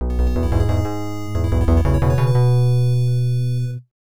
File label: Clear Fanfare; The Return of Ishtar; PC-8801